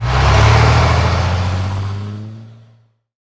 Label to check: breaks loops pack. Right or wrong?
Wrong